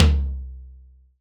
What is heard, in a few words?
tom, acoustic kit